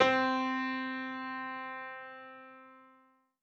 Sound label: KEY Bright (C), Melodic One Shots